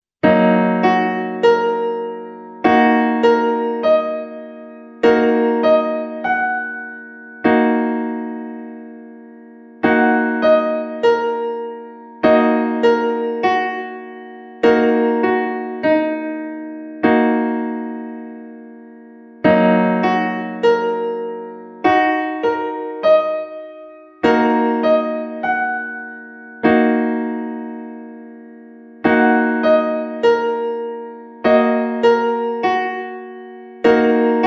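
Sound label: D sharp m Ebm Piano Keyboard Chord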